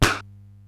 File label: SDS9 RM, Simmons SDS-9, Rimshots